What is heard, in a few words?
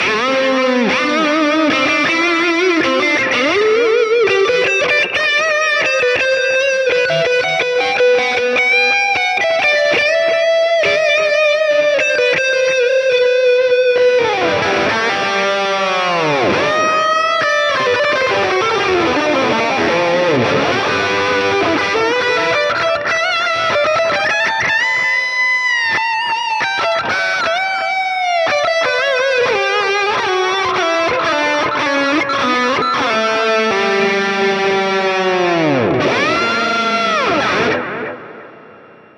Small Session Board Lead